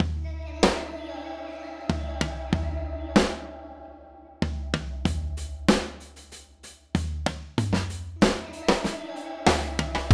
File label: DRUM